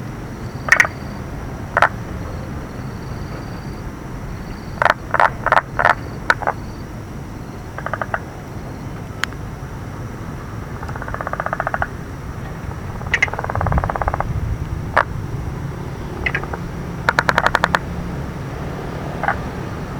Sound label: Polypedates leucomystax